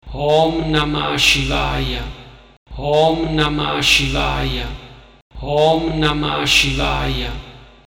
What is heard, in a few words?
Om Namah Shivaya, Mantra